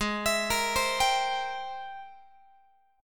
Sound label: G sharp Augmented Major 9th G sharp Guitar; G#+M9 arpeggiated; x,16,19,20,16,18; JGuitar